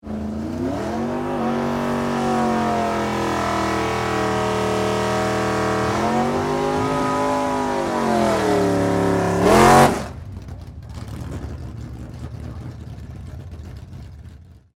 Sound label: Dragster Warm Up, Rev; Sports; Race Car Sound Effects; Vehicles